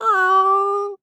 Animal Mammal Carnivore Cat Domestic Cat Meow; animals; AdobeCC

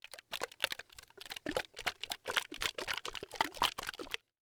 Household Shaving Cream Shaking Shaving Bottle

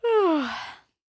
Human Female Yawn

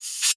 Multimedia Internet CD-Rom Flash Pop Up Short

